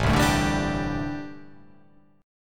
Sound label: A Minor 11th A, Chord